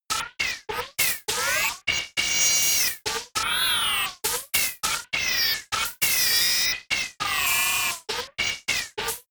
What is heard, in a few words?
sequence crazy noise percussion lead